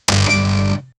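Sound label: solid antique wood; 03s sfx solid antique woo; electrical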